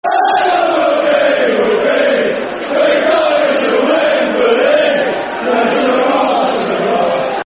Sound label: Football Chants (Soccer Songs); Portsmouth